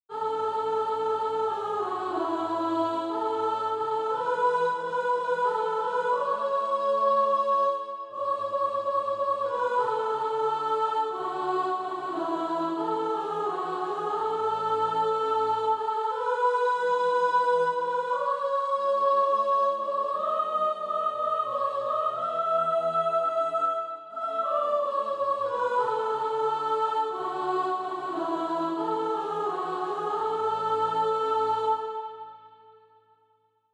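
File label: soprano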